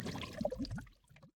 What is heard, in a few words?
flame trail; Smite; Sol